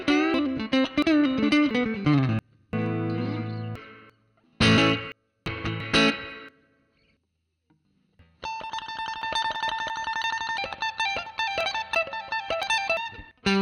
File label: ELECTRIC GUITAR; MyMix Recordings NAMM 2018